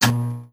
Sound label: start